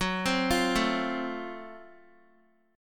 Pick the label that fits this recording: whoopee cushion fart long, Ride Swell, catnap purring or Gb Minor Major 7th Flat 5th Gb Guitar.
Gb Minor Major 7th Flat 5th Gb Guitar